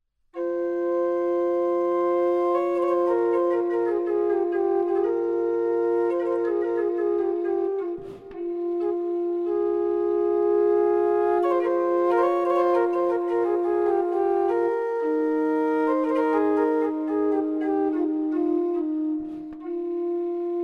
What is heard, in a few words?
Organetto